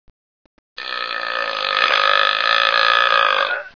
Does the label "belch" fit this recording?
Yes